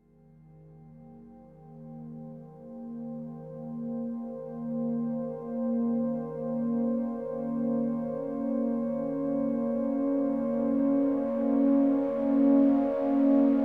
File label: k3 slow movement